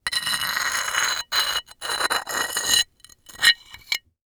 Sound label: Foley Stone Scrape Multiple